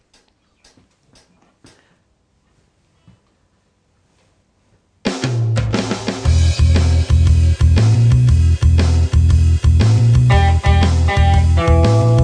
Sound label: Call Response